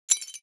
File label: imp bullet lightballistic tile debris